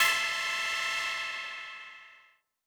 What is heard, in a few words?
M Dynamic Tech A4; KG MegaSaur